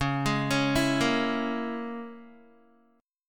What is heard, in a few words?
C sharp Minor 6th C sharp, Guitar; C#m6 arpeggiated